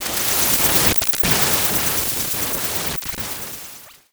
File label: Transition Noise Static; Production Element; Title Transition; AdobeCC